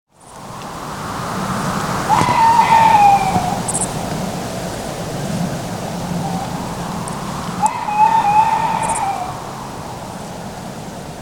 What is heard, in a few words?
Soundscape